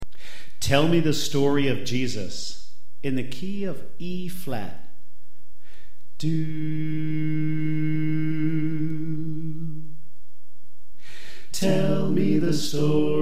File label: Bass Left